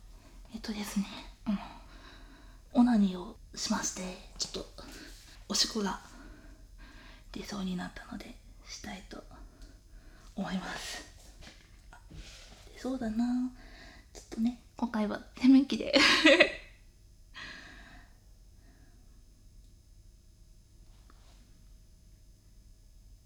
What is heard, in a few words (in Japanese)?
おしっこ (室内) バイノーラル